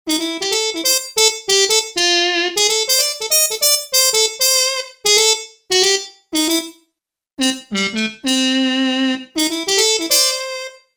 Harmon Mute, Roland JV-880; Factory Patches

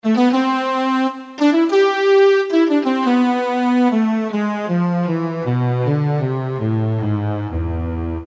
Vibrato Cello, Roland PN-D50-03

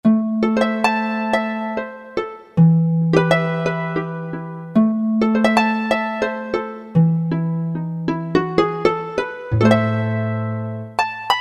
Authentic Harp, Roland JD-990; Factory Patches

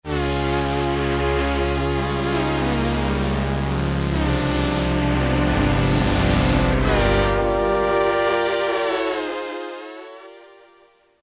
Br. Jupiters, Roland M-DC1

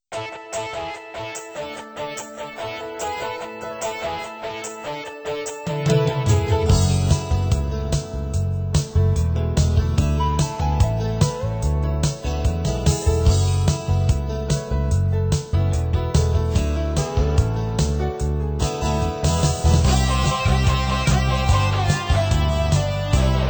Yamaha PSR-530

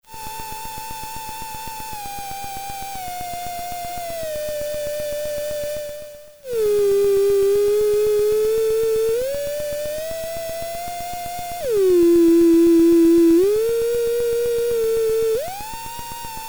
Voice, Realistic MG-1 Concertmate; audio examples from the Patch Diagrams

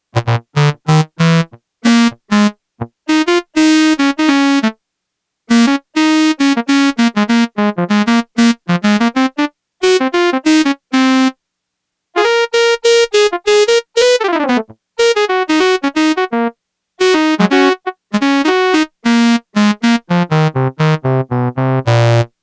Sax, Yamaha TX802; Factory Patches; Voices

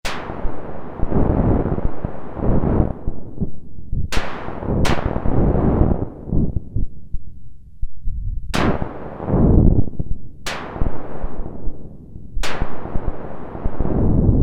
Thunder, Prophet-5; Sequential Circuits Prophet-5 (Ver.3); Factory Patch; File 3 Programs

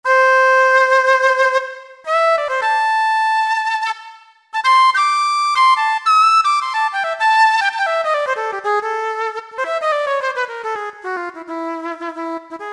Jazz Flute, Yamaha EX5R; Factory presets

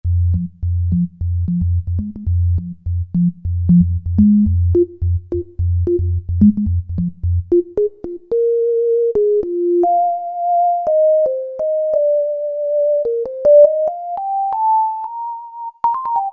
Pure Sine; Roland SR-JV80-11 Techno Collection

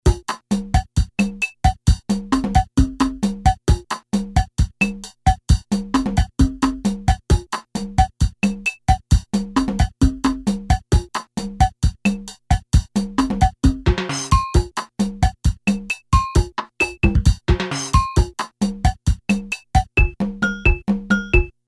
Bossa Nova, Casio CSM-1, Rhythm Patterns

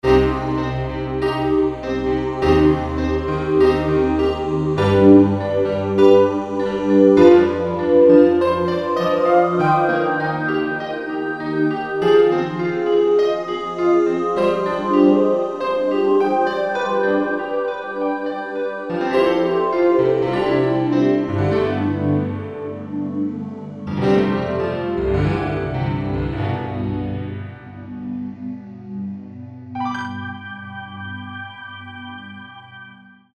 And Voice

